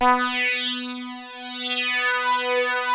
KORG, Rare Synthesizer; Hollywood Edge - Giorgio Moroder Rare Synthesizer Collection

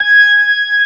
B3 G#5, organ; AKAI S-Series CD-ROM Sound Library VOL-1

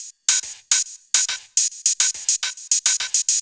Shuffled Loops, 140 BPM

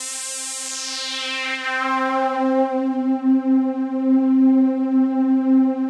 PAD, DIGI-PADS

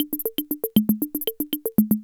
Loop, classic machines, rhythm77

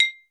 MATSU, JAPANESE PER; Crypton - DrumBase5000

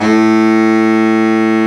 BARITON SAX

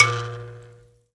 Mbira; Roland LCDP11 Africa VOL-1; M'biras; Bs Mbira 1

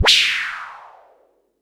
noise fx; Masterbits; Switch FX

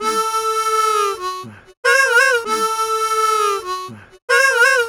Harm 098bpm A, Harmonica

